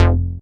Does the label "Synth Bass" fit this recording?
Yes